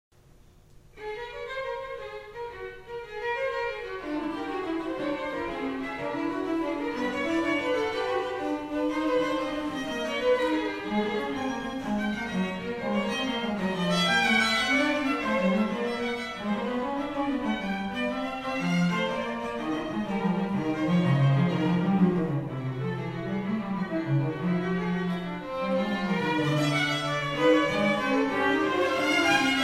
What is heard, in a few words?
gigue